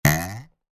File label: Game jump boing